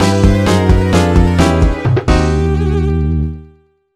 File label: Jingle Level Ko; Heathcliff Frantic Foto; NDS